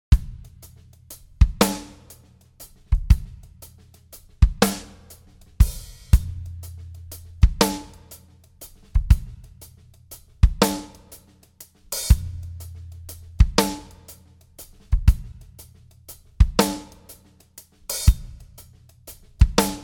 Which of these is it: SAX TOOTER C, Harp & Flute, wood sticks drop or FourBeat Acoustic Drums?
FourBeat Acoustic Drums